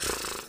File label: water bubbles